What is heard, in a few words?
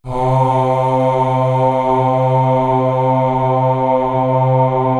cho c2, Choir AHH, KORG DSS-1; ProSamples vol.55 - Retro Sampler